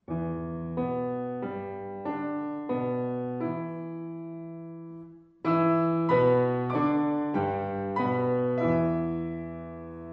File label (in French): variations pour piano à quatre mains; sur un thème de charles levadé